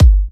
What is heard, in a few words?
G, Kicks; markus hakala